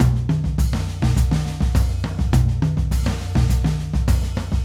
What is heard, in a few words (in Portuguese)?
Samba Cruzado